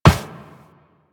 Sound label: Walk Metal Perforated, Footsteps